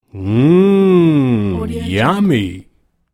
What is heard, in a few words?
mmm yummy